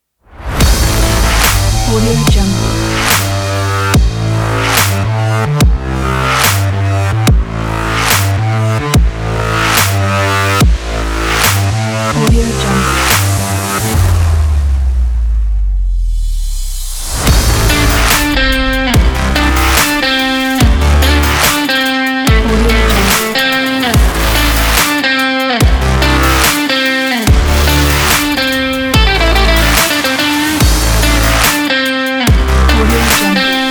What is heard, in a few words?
sport rock presentation